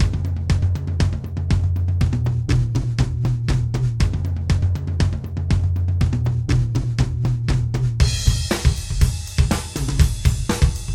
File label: ultra metal drums